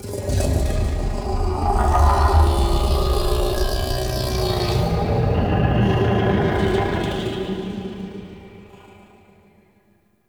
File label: Growly Vocal F; Ambience; Warframe; Man In The Wall